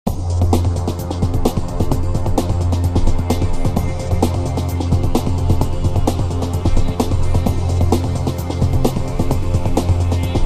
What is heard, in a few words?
music danger; Ioncross Total War